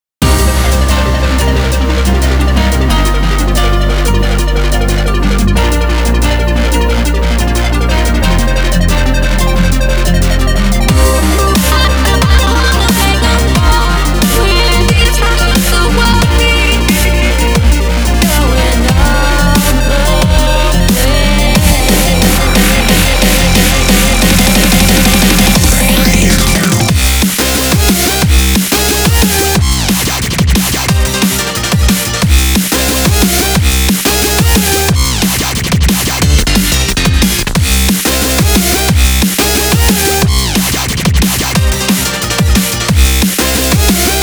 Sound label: DRUM'N'BASS; beatmania IIDX 22 PENDUAL